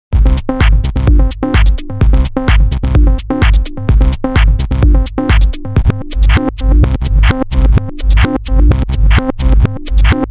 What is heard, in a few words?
Loop Reverse